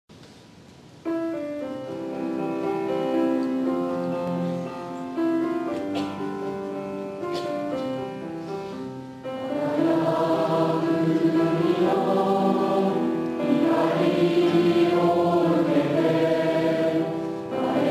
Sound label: voice